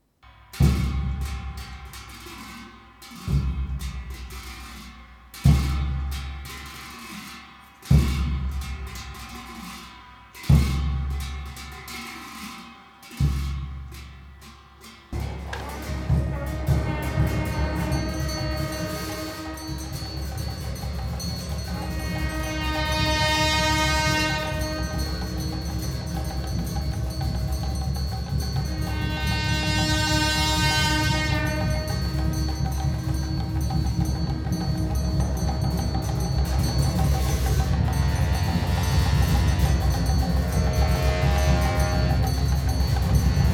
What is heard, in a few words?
Blowing Of The Big And Small Ceremonial Horns With Cybals; Yoga class mantras